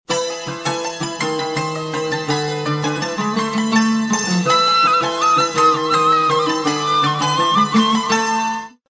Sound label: sms ringtone